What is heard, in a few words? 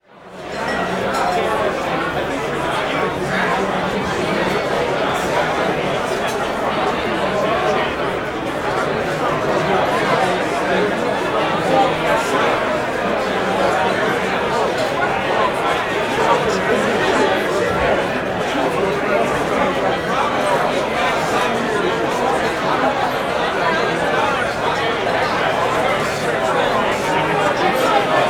Bar Pub Busy Crowded Bar, Walla; Sound Ideas; Walla Series